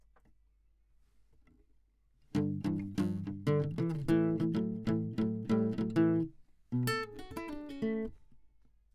Classic Guitar

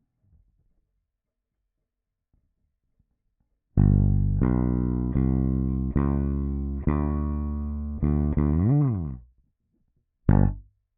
Bass Line, RNR for piano

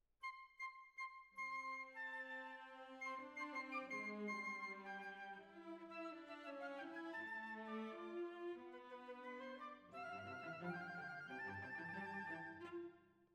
Violas Over

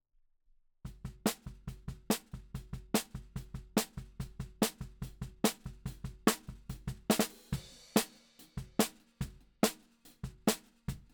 Snare Bot

